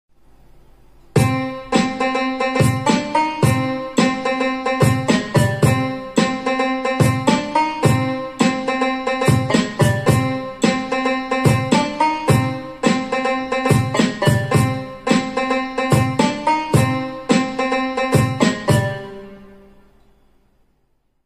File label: title track bgm piano; kartik aaryan; kiara